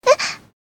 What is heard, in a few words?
combo break; [Mirakuru] Hanasaka Yui Wangi Wangi